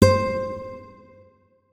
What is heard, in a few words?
notes, guitar; Hideout Furniture